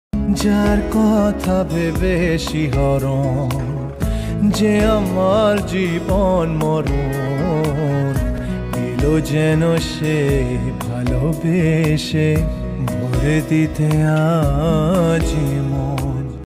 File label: Bangla song sad song romantic